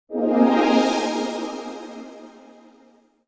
Sunrise; Blackberry Mobile Ringstone

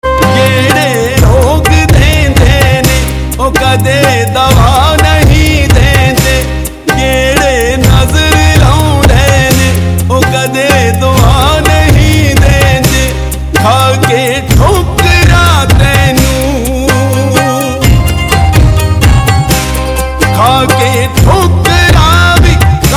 Punjabi New Sad Tones

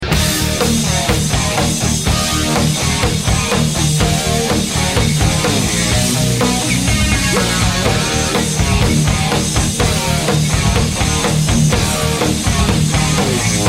Hi Fi Realtone; Human Beings

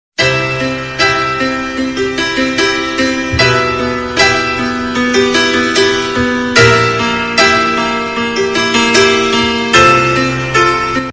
Instrumental Ringtone; Cool Diwali; Diwali Special

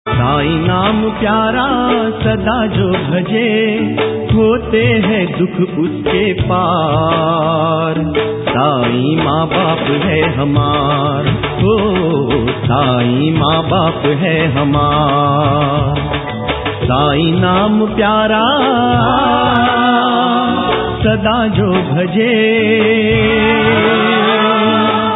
Devotional Ringtones; Lord Shirdi Sai Baba Ringtones

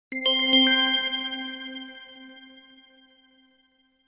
Shimmer, Ringtones; Blackberry Mobile Ringstone

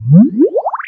Bubble, notifications; Mi Mix 2S Stock Ringtones

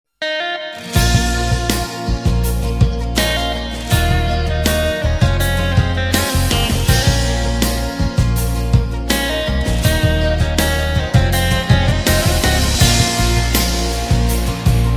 Rings; Beautiful Ringtones

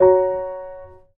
Piano, notifications; Galaxy S9

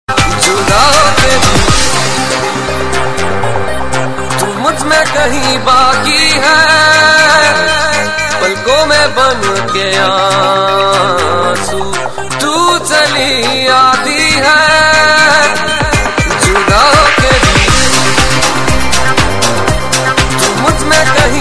remix, Hindi Sad Tones; Hindi Movie

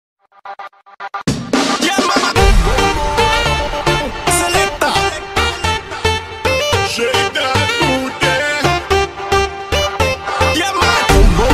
Arabic Ringtone

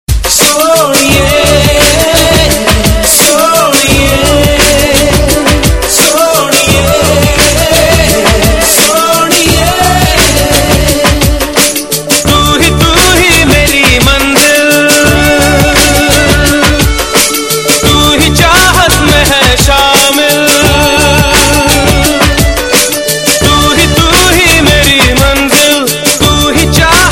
Hindi Sad Mp3 Tones; Hindi Movie

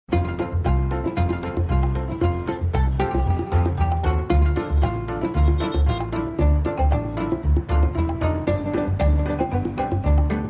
fun sound, Funny instrument Ringtones

